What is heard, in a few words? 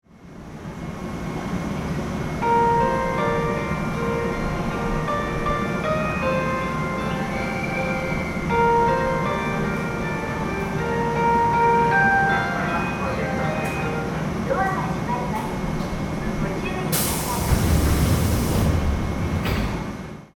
shinhamamatsu melody, station; enshu